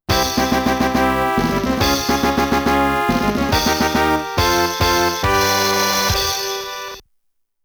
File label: A New Leader is Born (Fanfare); Otane Truth Demo; Gouketsuji Ichizoku 2; Power Instinct 2